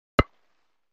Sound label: Croquet Mallet Hits, Croquet; One Ball Hits Several Balls